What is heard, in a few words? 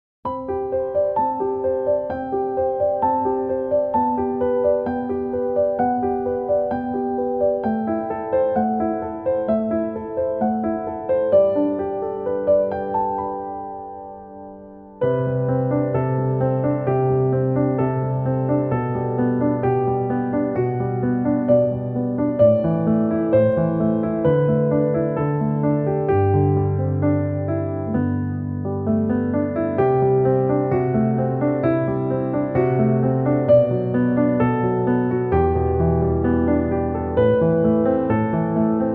piano sketch